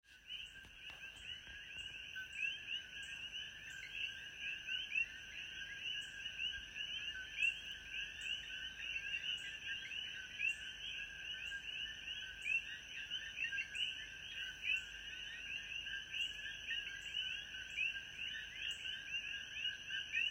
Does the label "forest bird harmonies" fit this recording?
Yes